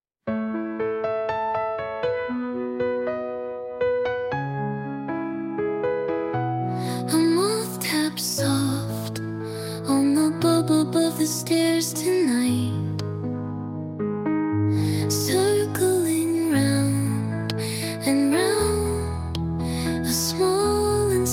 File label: lullaby, name songs; bonnie